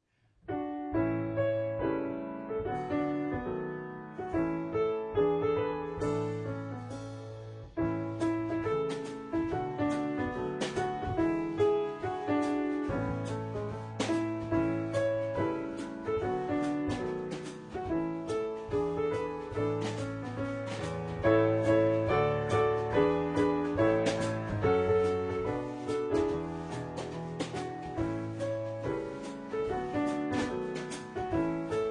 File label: piano drums